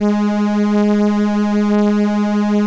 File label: ELKA, String Machines; ELKA RHAP